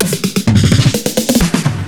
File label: Drum Fills, 128 BPM